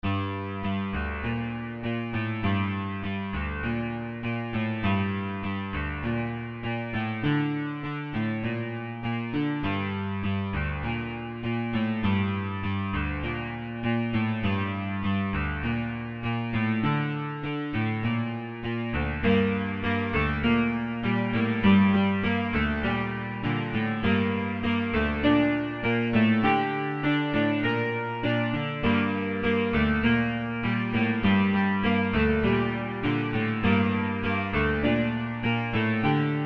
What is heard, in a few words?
piano